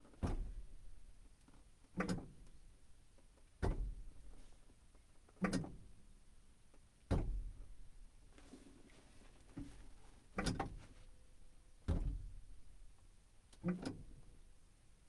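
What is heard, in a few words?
Pontiac Firebird variations sfx clutch interior; ambeo2; sonniss gdc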